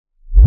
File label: Future Bass Kick Reverse